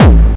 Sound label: Bassdrums Hard Kicks; Vengeance Samples